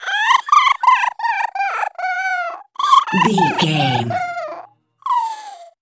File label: goblin fairy cry